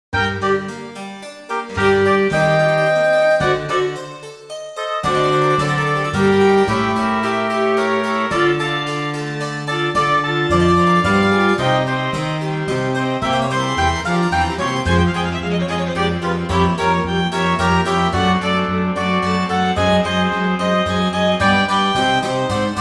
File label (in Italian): cembalo barocco